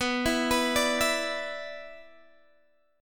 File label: B-Minor Double Flat 5th, Guitar Open G